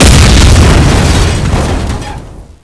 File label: crash sound